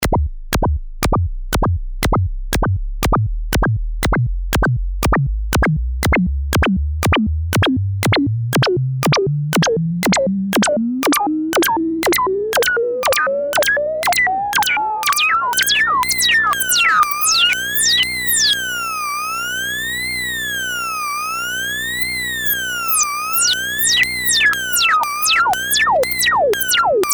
DSI Mopho Crazy FX

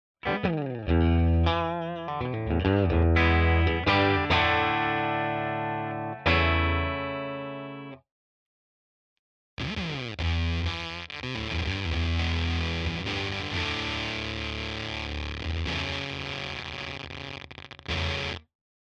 Walrus Fundamental FUZZ Gate

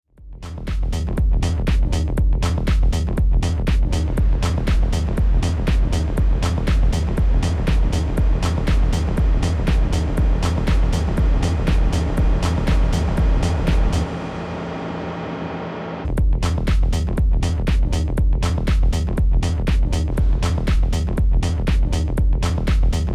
PLX 500 Reverb

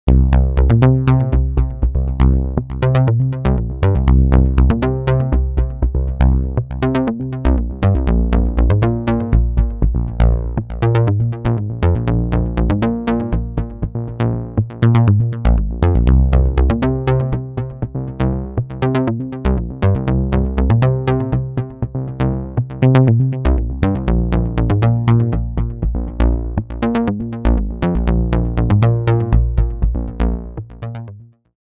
bass odd

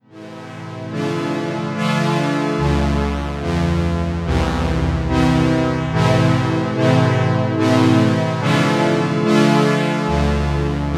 Delay DDRM Expander

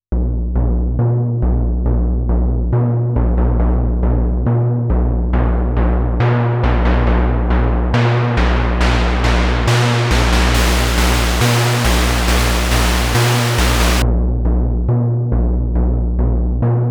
TritonAudio D2O Mono Synth DI Tube Fet

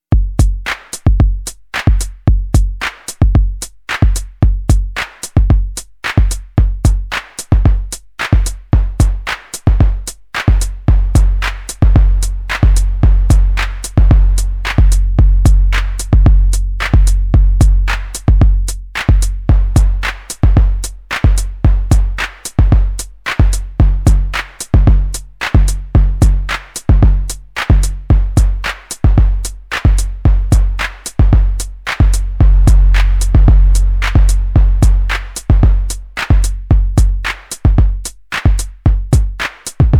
MFB Tanzmaus Noise Kick

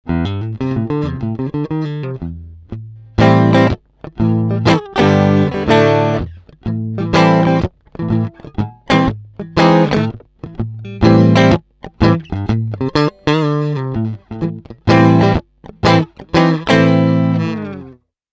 Middle Pickup Clean

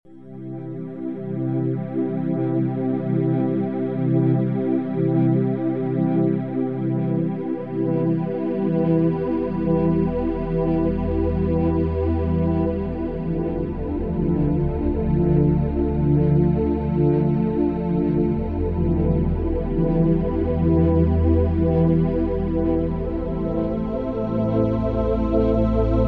Wavetable pad

